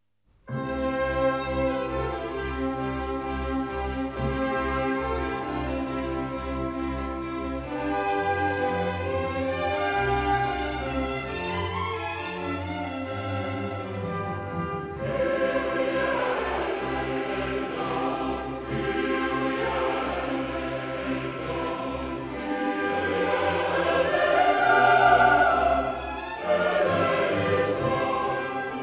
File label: Missa brevis in C Organ Solo Kyrie; Mozart; Davis, Gardiner